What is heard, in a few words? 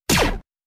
Blaster; DitexManager